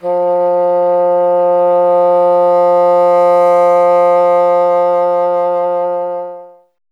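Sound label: wind bassoon F; Miroslav Vitous - Solo Instruments VOL-1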